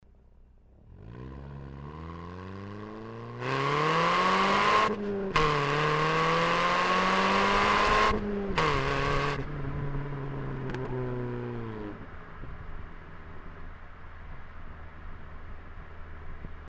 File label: after driver side